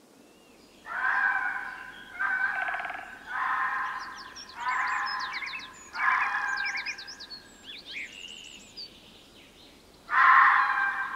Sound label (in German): Fuchs